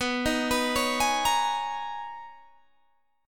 B Major 13th B Guitar Half Step Down

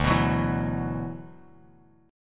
D 6th Add 9th D, Bass Standard; D6add9 strummed; 10,14,16,9; JGuitar

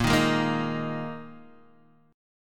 Bb 7th Suspended 4th Bb down; strummed; JGuitar

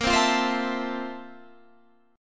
F Major 7th, Bb; Fmaj7/Bb; strummed

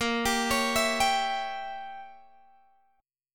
Bb Diminished 7th Bb down Guitar Open G; arpeggiated; Bbdim7

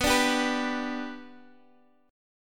B Minor Sharp 5th, Guitar, Half Step Down

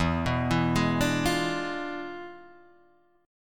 E 7th Flat 9th, E7b9 chord; arpeggiated

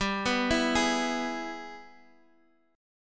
Ab Minor Major 7th Sharp 5th chord

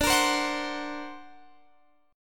D Augmented 7th D down Guitar Standard; D+7 strummed; capo=3

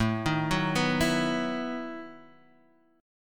E Major 7th Suspended 2nd Suspended 4th

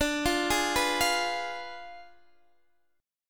D Diminished 7th D, Guitar, Open D

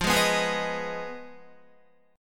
F Minor 7th Flat 5th F down Guitar; Fm7b5 strummed; JGuitar

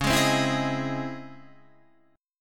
Db Minor Major 9th Db Guitar Half Step Down; DbmM9

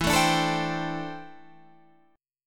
D 7th Suspended 2nd Suspended 4th E

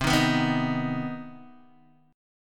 C Minor Major 7th C Guitar DADGAD

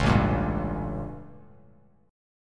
A 7th Flat 9th A, Bass Standard; A7b9 strummed; JGuitar

